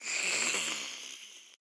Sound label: Centipede Call From Below